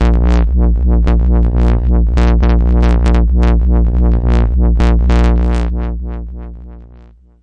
overloaded A sharp 1, Overloaded Unstable Synth Bass